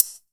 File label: HH, CLOSE HH, House Side Of 2 Step; USB Soundscan vol.51; AKAI